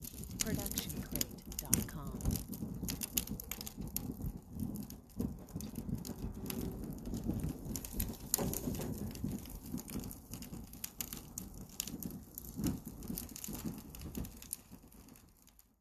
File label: Outdoor Small Fire; SoundsCrate